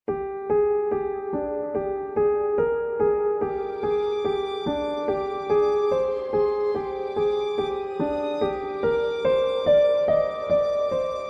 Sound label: horror thriller; Girls Grown Up